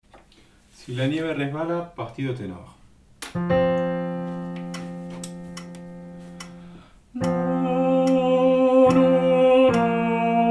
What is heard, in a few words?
Tenor